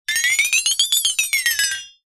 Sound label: cartoon wobble metal flexitone